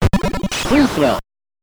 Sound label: Free Throw; Double Dribble; NES